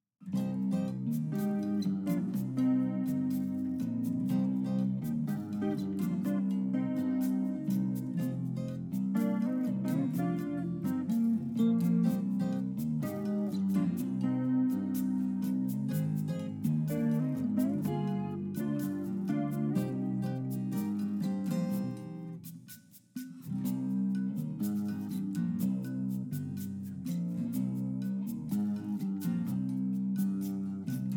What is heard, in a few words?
Jungle Tango; Meet Mrs. Boa Save Little Skeeter '99; putt putt saves the zoo